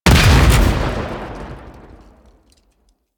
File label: Primary Barrell Explosion, Weapons; Warframe; SU Modular Guns